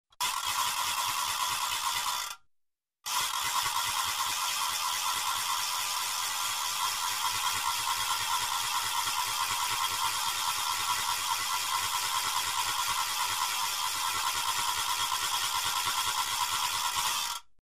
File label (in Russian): treschotki